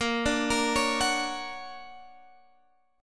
D Augmented Major 7th A sharp, guitar

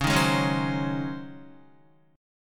Db Major 7th Suspended 2nd Suspended 4th Guitar; 4E,4A,5D,5G,5C,6F; strummed; chord shape

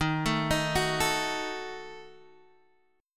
Eb Diminished, Guitar Open D

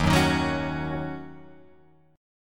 D sharp Minor 7th, Guitar, Open F; strummed; JGuitar

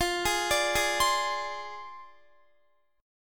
F Minor 6th F down Guitar

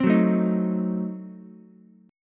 D sharp Diminished 7th D sharp, Ukulele; D#dim7 strummed; 5,6,5,6

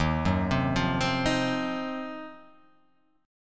D sharp Minor 6th Add 9th D sharp, chord; 11,9,10,10,12,10,x,x; chordshape

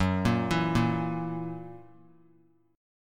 F sharp 7th, down; arpeggiated; 3C,3F,3A,3D

